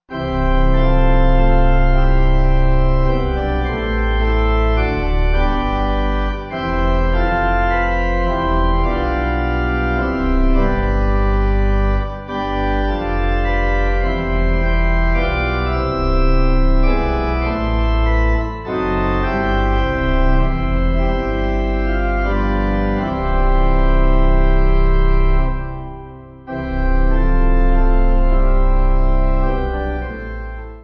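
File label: Pipe, Pipe Organ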